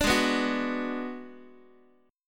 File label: G sharp Minor 7th Flat 5th, Guitar; strummed; x,x,5,6,3,5; 4A,4E,5A,5D,5G#,6C#